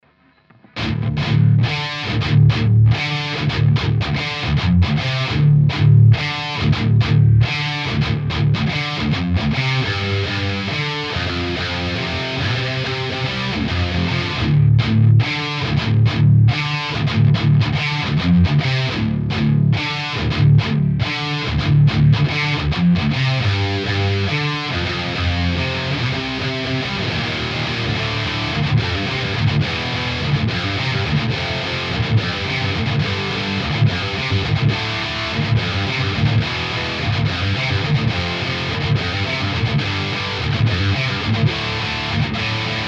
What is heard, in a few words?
modernboost, Mesa/Boogie Dual Rectifier